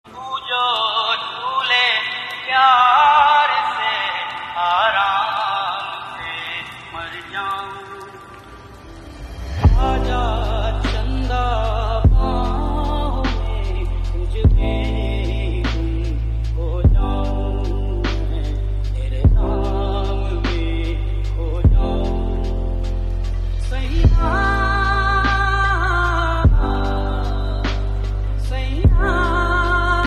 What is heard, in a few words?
Lofi